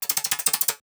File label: FX; TRUTH-HZ; Crowdsourced Sample Pack; Digital FX